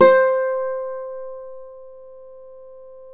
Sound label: Celtic Harp, Plucked Strings